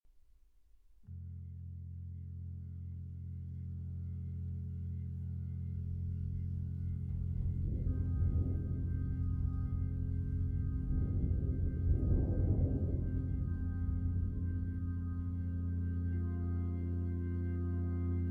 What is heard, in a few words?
Arr. For Chamber Ensemble